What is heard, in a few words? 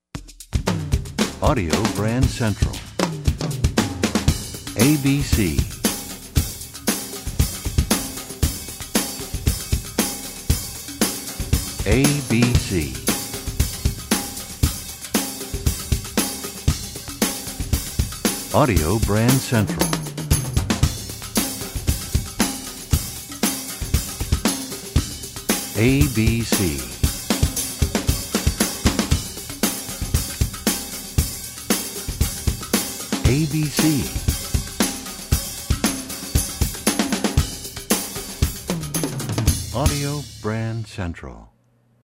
Desert Wind, radio jingles